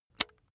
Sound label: razor attachment extend